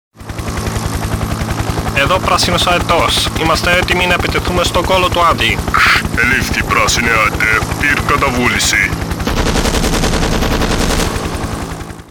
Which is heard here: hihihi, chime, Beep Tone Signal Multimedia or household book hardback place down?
hihihi